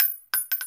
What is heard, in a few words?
Pistol shell casing